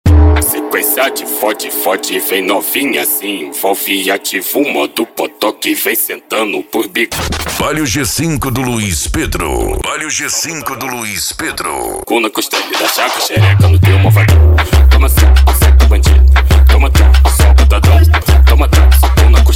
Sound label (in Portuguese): funk mega bass e forro; urupa ro